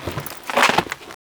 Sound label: Hunting Bow Load; Warframe; Foley